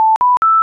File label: alarm; iss radio monitor